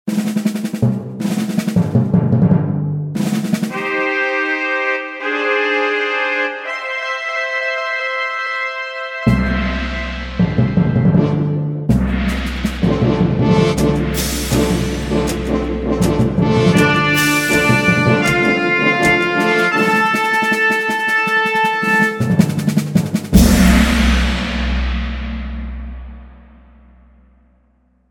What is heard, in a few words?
Fanfare; besondere Fanfaren